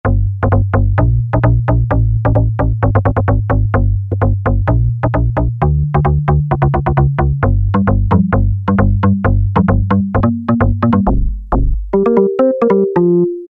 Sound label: Poundage, E-mu Morpheus; Factory Presets